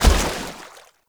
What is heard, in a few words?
Step Water, Footsteps; Characters; Enemy; Mendicant; MndKing